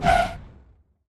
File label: quick Stop; netent; multipliermayhem